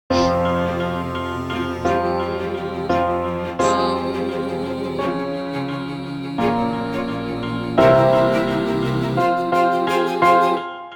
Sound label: Progression with voice String